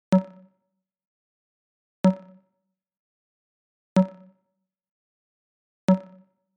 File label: Perc 808